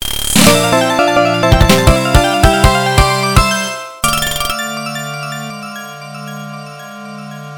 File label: WIN